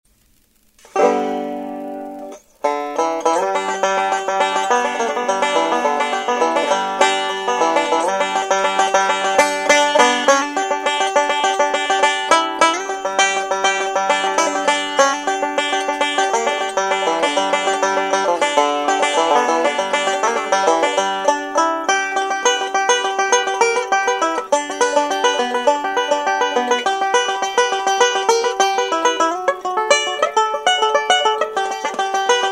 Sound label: nady mic